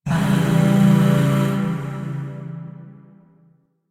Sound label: Zariman Void Shawzin Phrygian Chord